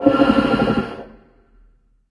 search, antlion guard far; hl2

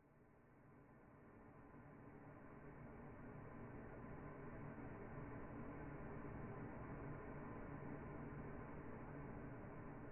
Tension by Stone; BeautifulNoise; Intensity by Design

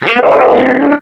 warn, zombie poison; hl2

